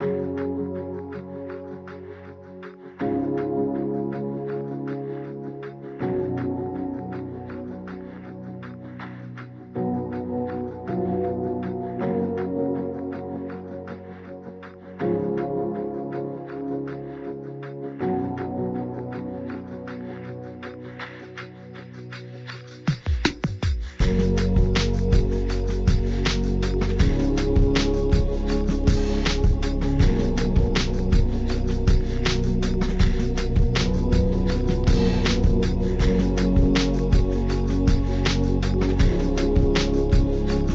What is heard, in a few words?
Lo-Fi; Lo-Fi Music Pack Vol. 2